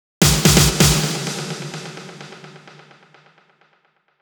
128BPM Unity, House Electro Fills; 1Bar; Vengeance Samples

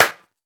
Minimal Perc; Minimal Percussion

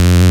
Synth Bass; Vengeance Samples; VEE Synth Shots

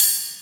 Cymbals Ride; Vengeance Samples

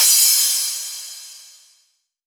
Crash; Vengeance Samples; VES1 Cymbals

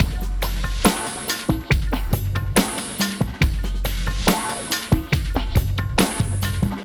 Slomo Beat 140 BPM, Loops, Slow Motion Beats

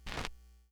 Needle Drop, Vinyl Record Samples; Needle Noises

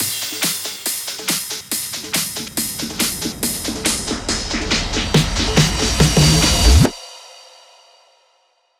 140BPM 4Bars, HandsUp Trance Fills; Shortmatic; Vengeance Samples

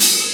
Open Hihat, Cymbals; Vengeance Samples

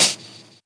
Cymbals HH Open; Vengeance Samples

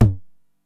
TOM H, 909; musicradar-synth-samples; Classic drum machines; 909TOMS

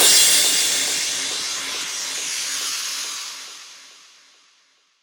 Crash, Cymbals; Vengeance Samples; VEH2

